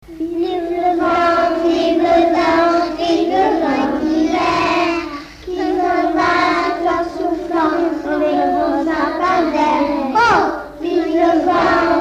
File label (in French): vive le vent; Cerons; noel; Comenius